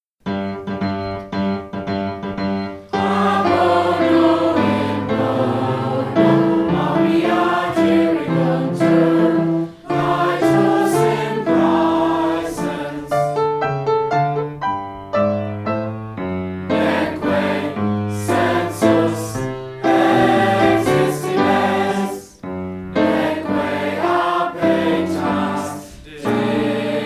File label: Anthem sung